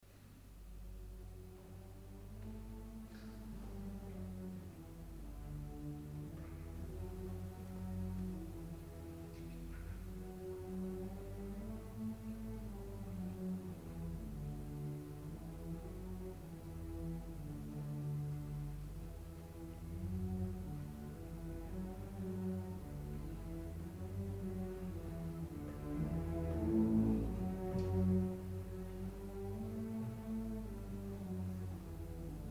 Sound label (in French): theme unissono vc cb; le chantent en premier, à l'unisson, seules; dépouillées; Beethoven